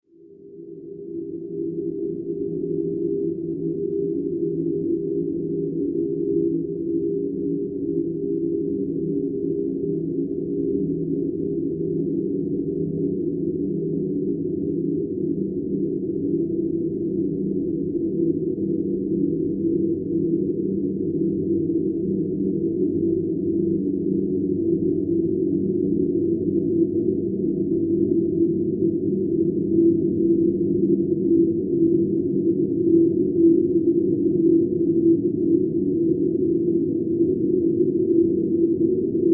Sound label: Horror Drone Ethereal Powerful Angelic; Evil